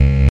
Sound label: error